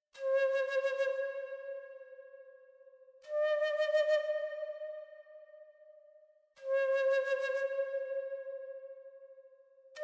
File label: native american flute